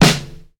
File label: reverb snare single hit e key